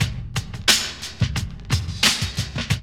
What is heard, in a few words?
LOOP; E-Lab; X Static Goldmine 2